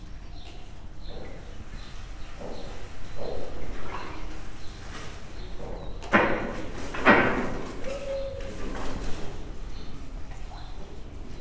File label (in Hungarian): amely hangu flamingo es a hasmeneses ibisz, torpe flamingo; debreceni zoo 2012